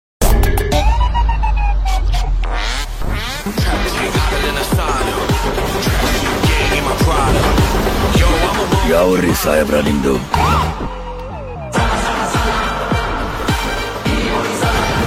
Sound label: Ringtone; Hoysala